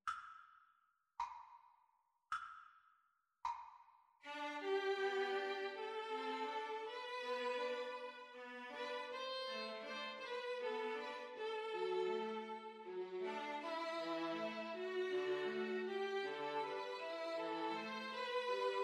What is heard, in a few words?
String trio